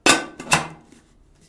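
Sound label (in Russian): металл закрытие короткий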